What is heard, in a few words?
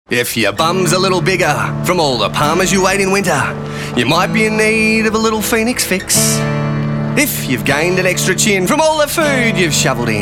singing